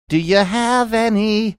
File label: singing do ya have any